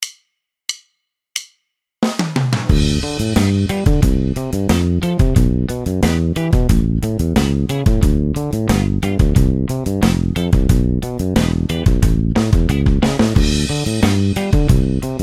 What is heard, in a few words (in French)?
main gauche exercice, basse; exercices